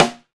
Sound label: SNR, Brz Picc Snr; NorthStar - Global Instruments VOL-2